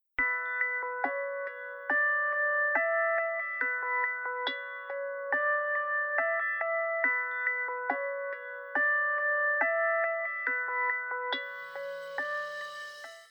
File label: Ring